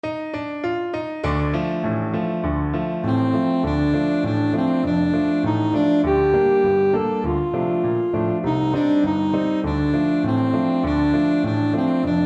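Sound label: alto saxophone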